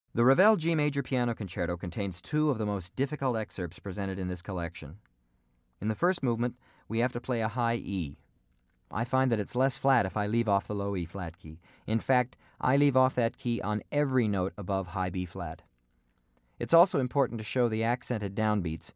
Piano Concerto In G, Orchestral Excerpts For Bassoon